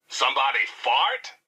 somebody fart

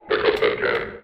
can

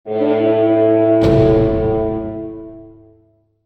rep gain

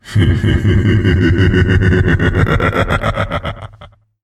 laugh; moai

